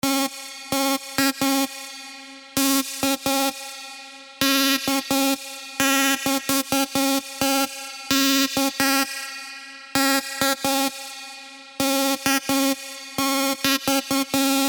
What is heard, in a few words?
LEAD